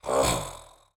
House Death Vo; Android; Portal Quest

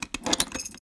unlock door; through abandoned 2 the forest 2016 gamerip pc

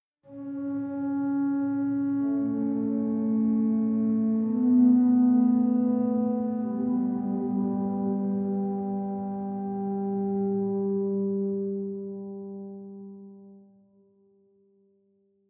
Organ Mid

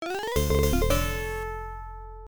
Bonus Stage Clear; The Simpsons; IBM PC AT

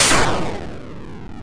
SFX - Release; donovanni the vanni ver .4.2 2021

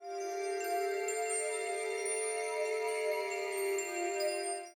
Ui Hero Selector

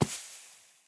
Quick Sand Bounce; Doodle Jump 2

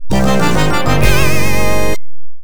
Jingle, pinball; Dracula Jingle; Taxi Williams pinball

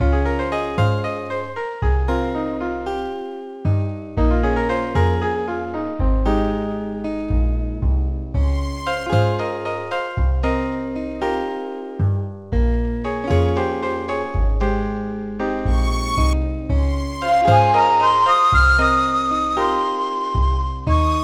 Music Mini Games Soft

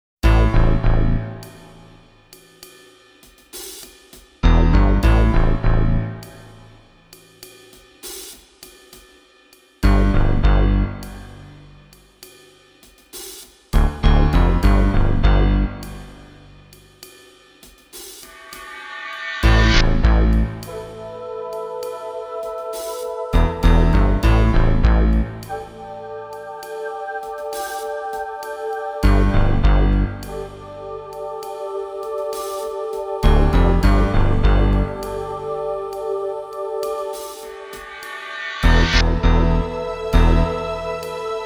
gothic horror; Ikkitousen Original Soundtrack

